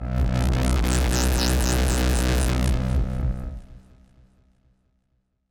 bass little b; sidsonic tubes

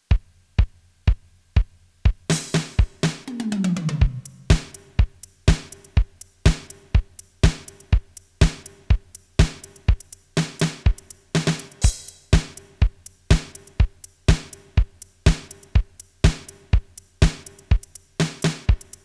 startone mk-200 beat dance

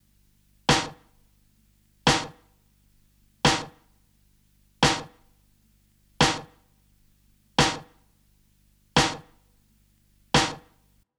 snare

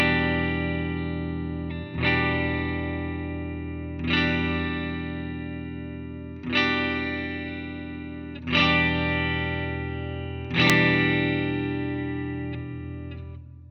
guitar match strat; Positive Grid Bias FX 2

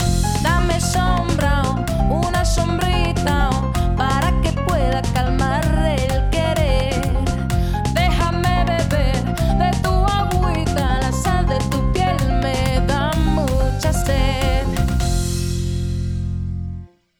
uad empirical labs distressor mix distressor; uad empirical labs distressor test

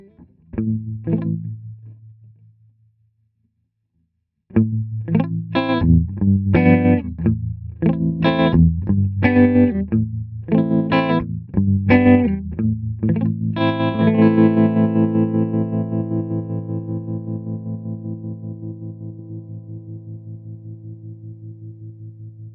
riff std harm

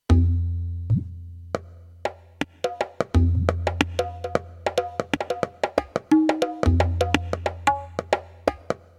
drums ethnic; casio xw-g1

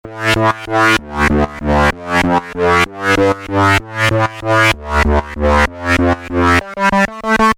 Bpm128 A Cryptic Bass

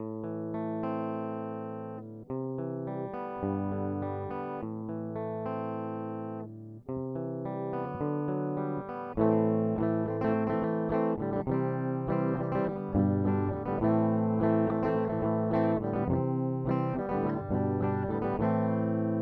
clean guitar; IK Multimedia AXE I/O Solo; ztonebold jfet; IK Multimedia AXE I/O Solo Test

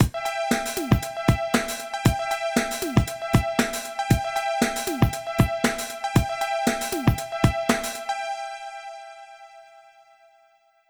Alesis SamplePad Pro

